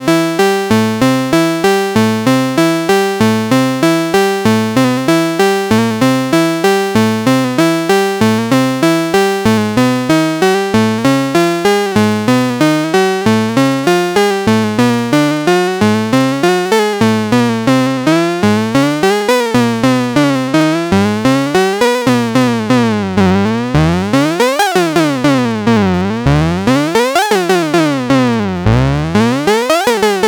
Coarse Robot LFO Tri; Bastl Thyme

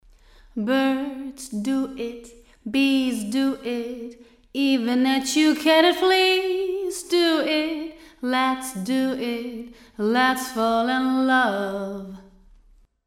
Vocal Short Plate

